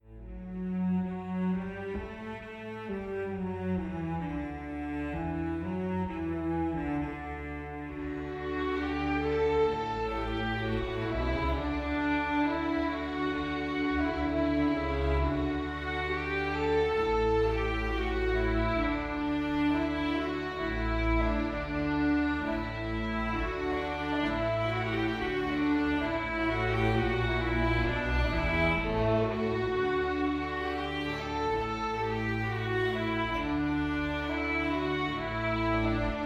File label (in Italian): base canto; inno alla gioia